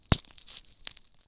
ice; Level1 Ice Cliffs

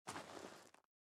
step gravel; out of reach treasure royale 2020 gamerip pc

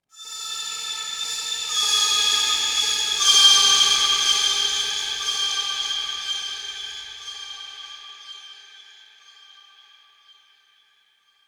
Oneshot Synth; Zombie Army 4 Dead War

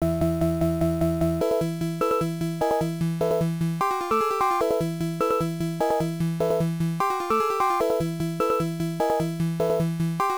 In-Game Music; Motos; x68000

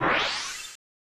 Swoosh

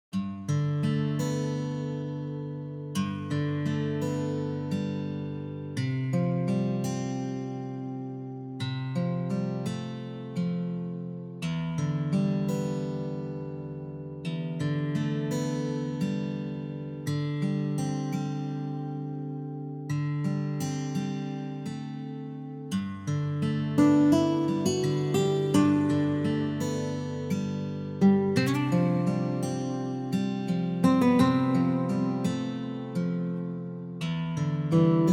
Area Acoustic; aster tatariqus android ios mobile gamerip 2023

